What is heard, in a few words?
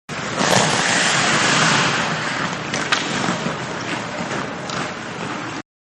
Ice Hockey Skating to a stop; Hockey (Sound Effects:Sports); Manhattan Production Music